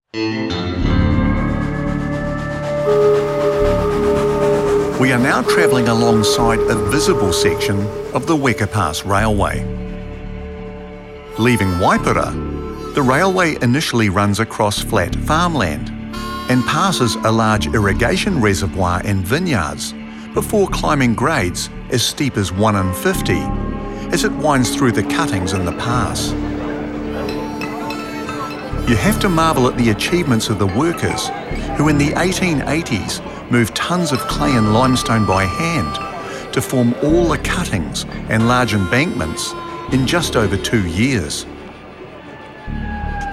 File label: Weka Pass Railway; NZ APP S409B